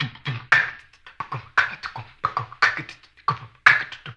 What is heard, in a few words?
HAMBONE, Blues & Soul